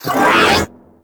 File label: Stomp Cast; Warframe; Caliban